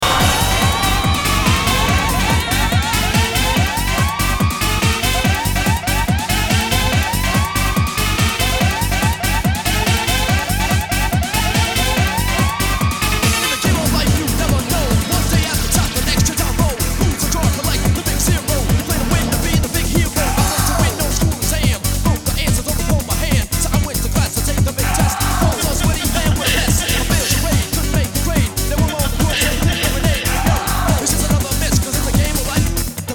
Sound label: beats